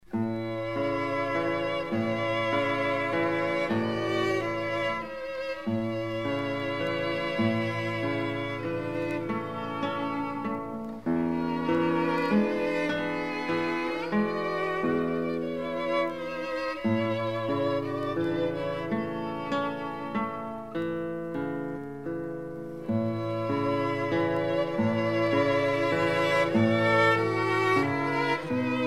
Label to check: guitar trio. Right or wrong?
Right